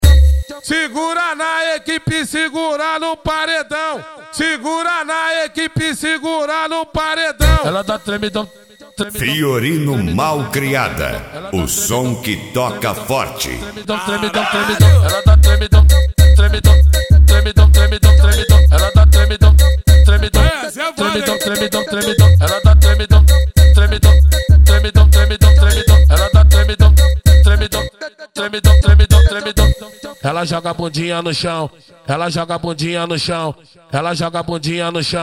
mega funk bass

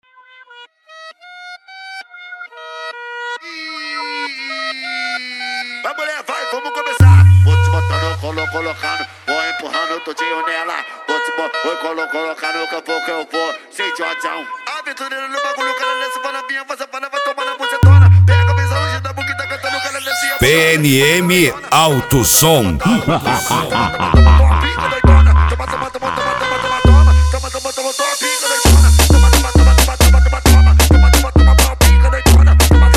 funk

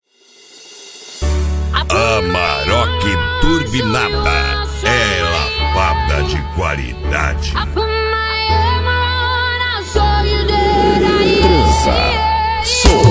reggae remix; amarok turbinada par jamaica reggae

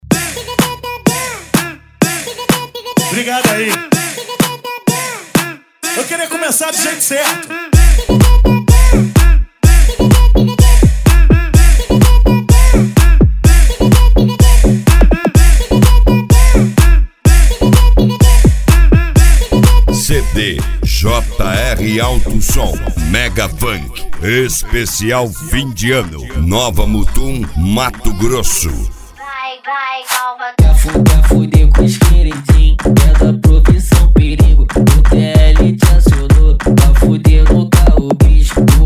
mega funk; jr auto som

